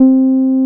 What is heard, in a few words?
TB 303